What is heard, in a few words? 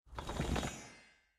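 Binary Pillar Reactors; Mountain SFX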